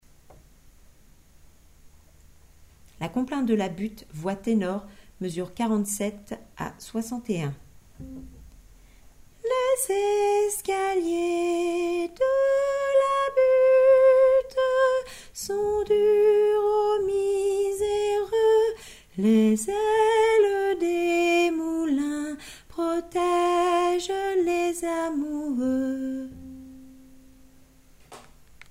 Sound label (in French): la complainte tenor